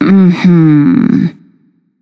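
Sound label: nonverbal agree; smite; voicepack; izanami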